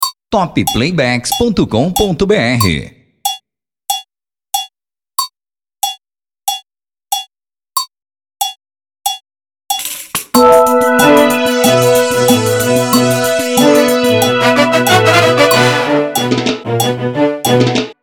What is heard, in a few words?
Berimbau Metalizado